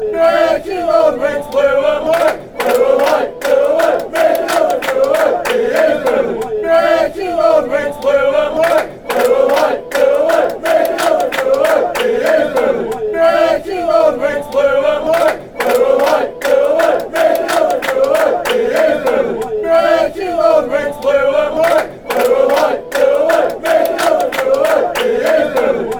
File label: blackburn chant